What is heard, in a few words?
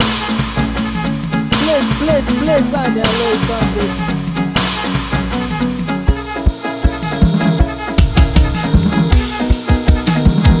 Electro House Beat; Electro Mara House Beat